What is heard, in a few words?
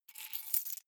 key ring grab stone; objekte; schluessel